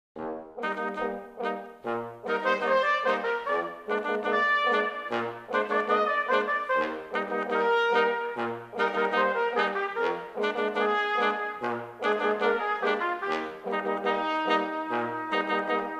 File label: Brass Quintet; Premier